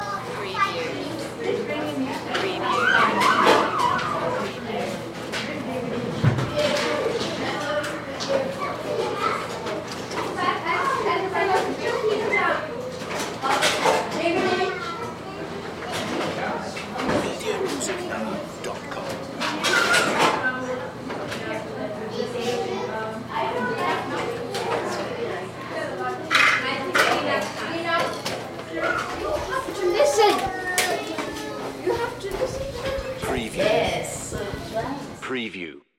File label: Pre School Amb